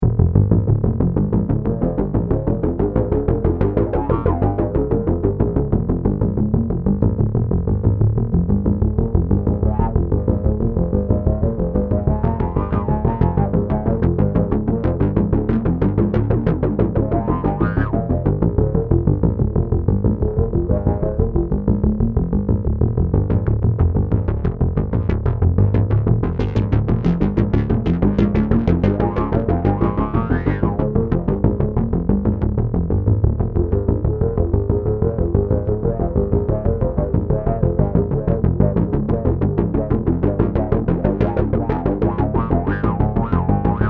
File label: Korg Seq